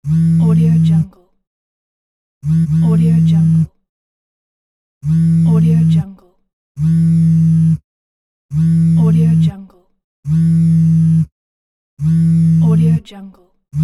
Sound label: phone vibration